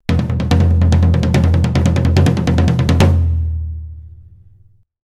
drumonderdelen

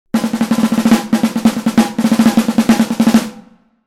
drumonderdelen

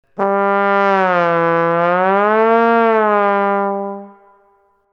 glissando trombone

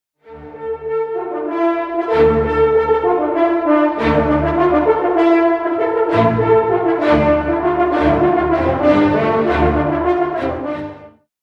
hoorn

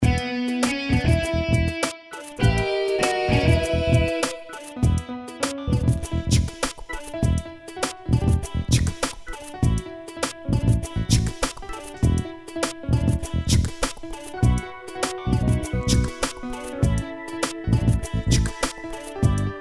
zonder zang

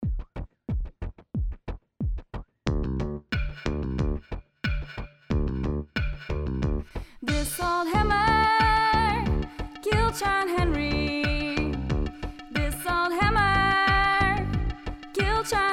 vlaams zang; this old hammer

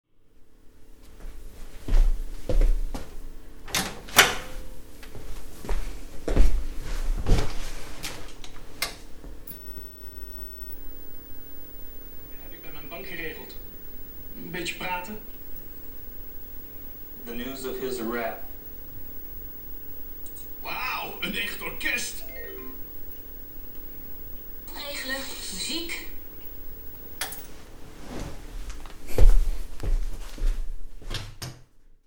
thuis geluid

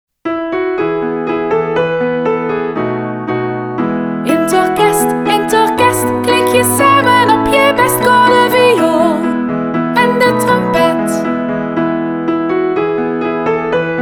intorkest met zang

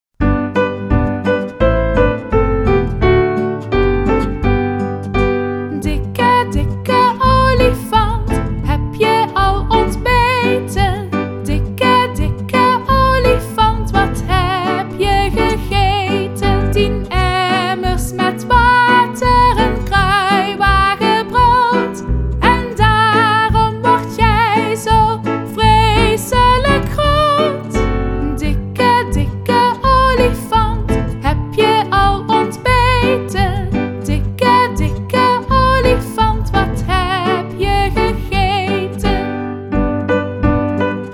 dikke dikke olifant met zang

